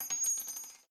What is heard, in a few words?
50cal Land; Red Hot Vengeance